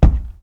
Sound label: vent step; Golden Crayon Sounds